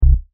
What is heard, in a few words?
ppg bass